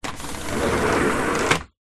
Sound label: household screen door insect slide